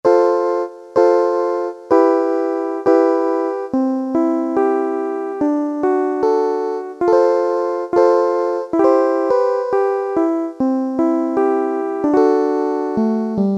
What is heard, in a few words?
PIANO, Casio Rapman; Tones